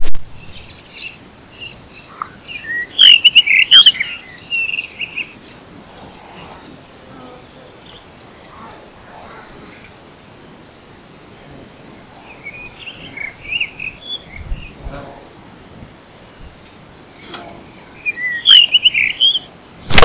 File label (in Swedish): Stentrast